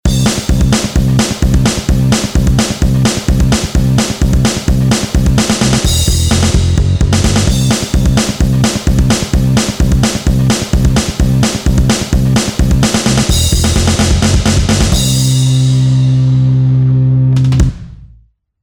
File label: Rock Taste, Akai MPC1000